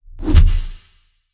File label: Smoke Granade Launch; we are the dwarves gamerip 2016